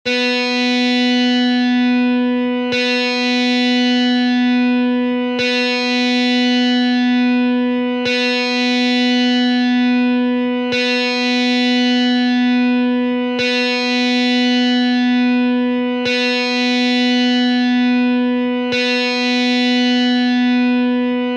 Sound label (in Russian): struna Zvuki strun elektrogitary